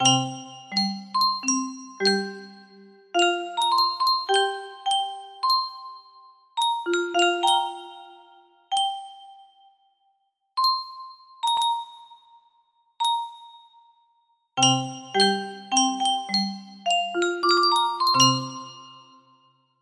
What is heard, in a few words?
music box melody